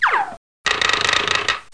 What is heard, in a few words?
Piece Good; Bible Fun Pack; Education Publishing Concepts; NOAH